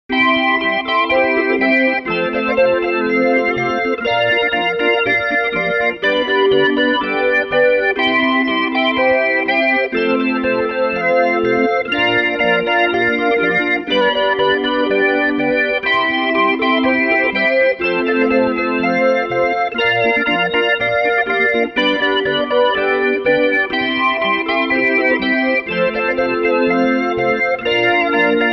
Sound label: GuitarMusic